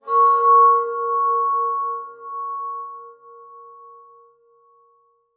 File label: Transition Bell Chime; Production Element Title Transition